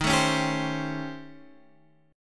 A 7th Sharp 9th Flat 5th; x,6,7,6,8,8

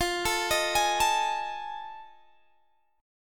F 7th Sharp 9th, Guitar, Open G

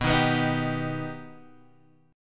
B Augmented B Guitar Half Step Down

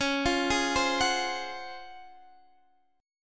C sharp Major 11th C sharp, Guitar Half Step Down

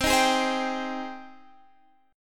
C Minor Add Flat 6th C

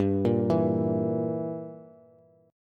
G Minor 6th, Bass; Gm6 arpeggiated; JGuitar

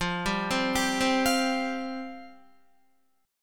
F Suspended 2nd F Guitar; Fsus2; arpeggiated; 13,10,10,12,12,12

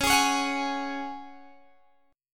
Db Suspended 4th Db Guitar Open G; x,18,18,18,19,18; strummed

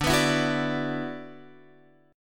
D 6th Add 9th D, Guitar, Open F; D6add9 strummed; x,9,11,11,9,9; JGuitar

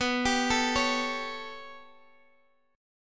B 7th Suspended 2nd Sharp 5th B

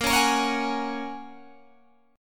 Bb Minor 7th Sharp 5th, Guitar, Open G; 20,18,20,18,19,18

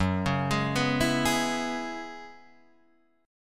F sharp 9th Suspended 4th F sharp Guitar Drop D

